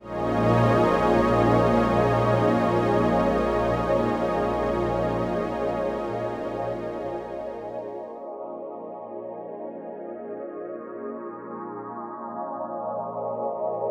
automated pad wet

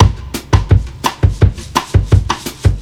bossa drumloop tube saturated loudness

